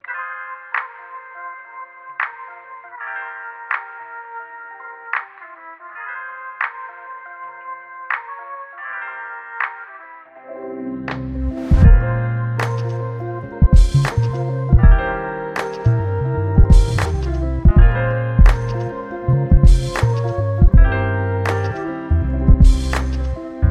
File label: jazzy